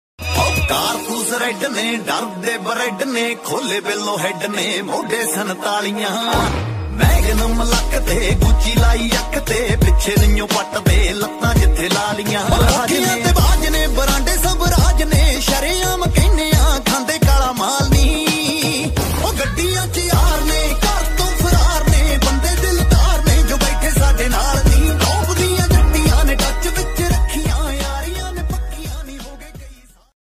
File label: Desi Punjabi Ringtone